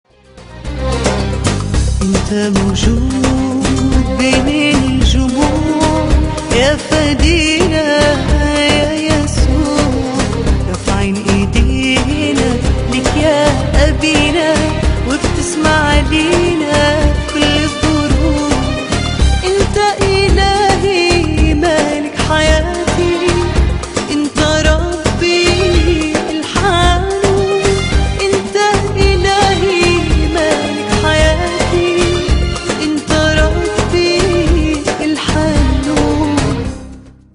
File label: Ringtone